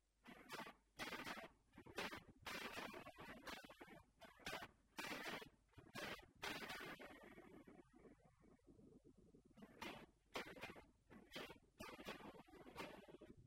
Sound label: JMI SG